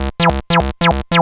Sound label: saw